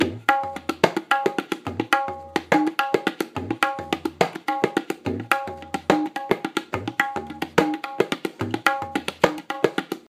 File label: Tabla; Candlelight